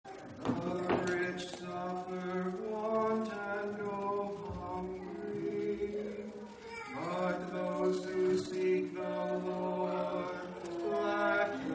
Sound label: Communion Antiphon